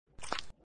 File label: Suck; Lewd SFX